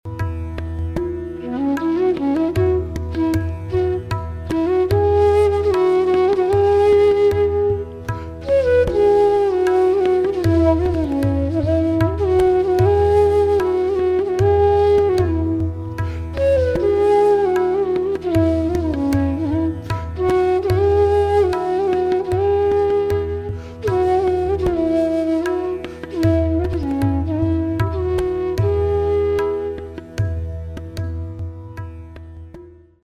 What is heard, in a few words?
Jhaptal Bhoopali E